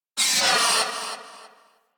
Iron Blast Cast Fully Charged Proj Explosion Sweetner; Warframe; Warframes